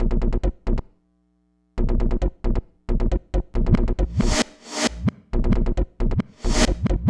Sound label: Old Schoolin 135 Bmin, hardcore breakbeat; Big Fish Audio - Hardcore Breakbeat Action